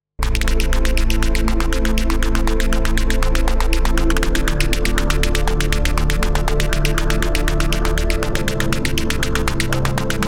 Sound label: FM Hat; Kobol